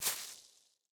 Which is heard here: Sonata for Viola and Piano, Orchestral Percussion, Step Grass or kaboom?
Step Grass